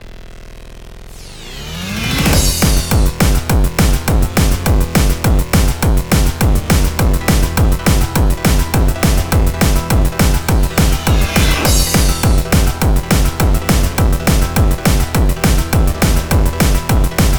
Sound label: Instrumental; Friday Night Funkin' vs. Dave and Bambi Golden Apple